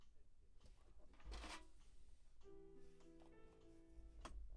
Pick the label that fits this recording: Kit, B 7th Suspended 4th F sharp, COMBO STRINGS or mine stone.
Kit